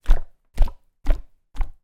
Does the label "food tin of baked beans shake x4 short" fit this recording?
Yes